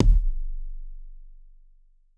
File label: C1 Tuned Kick; One Shots; Kicks; Touch Loops; ElementsOne; Abstract Rhythms